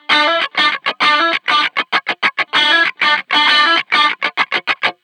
カッティングギター コーラス

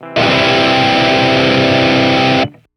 歪みギター

